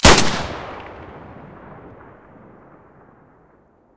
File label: m9a3 muzzle stereo; beretta